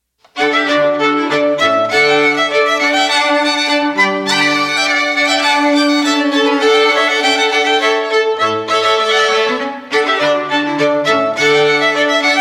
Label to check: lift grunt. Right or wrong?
Wrong